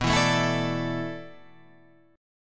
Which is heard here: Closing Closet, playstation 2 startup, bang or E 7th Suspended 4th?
E 7th Suspended 4th